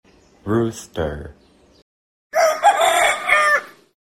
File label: rooster